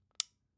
flashlight release

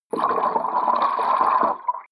flowing submerged small burbling

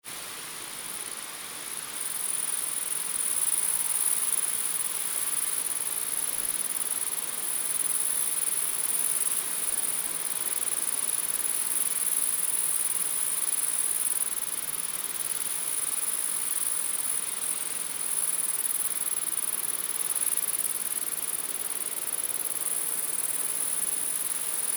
grasshoppers in the wind

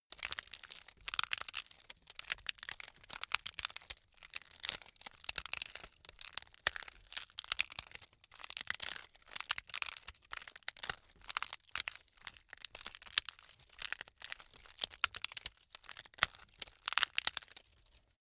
bamboo crack sequence

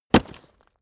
stone plate drop

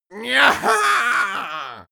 imp attack

